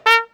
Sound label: Trumpet Note